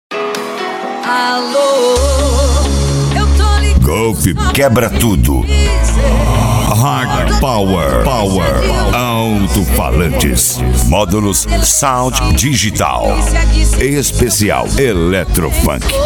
eletrofunk

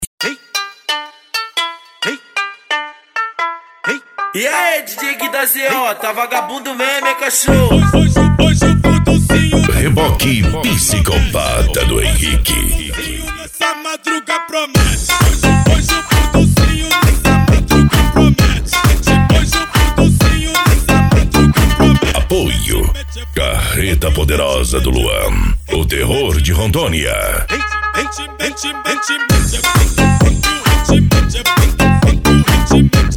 eletro funk

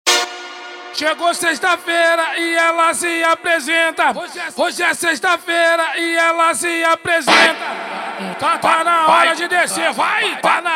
funk grave

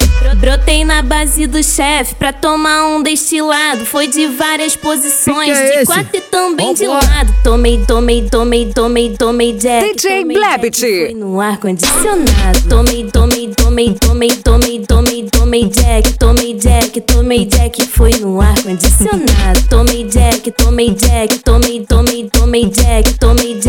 funk sertanejo

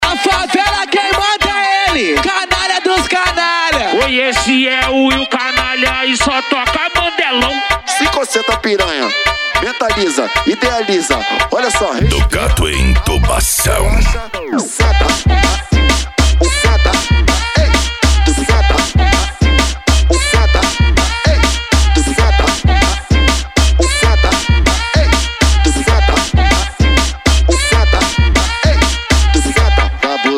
funk agressivo

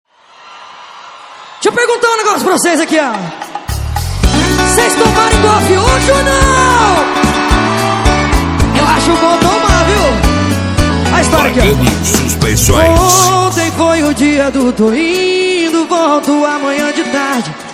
especial sertanejo; pagani suspensoes; cristopher pg

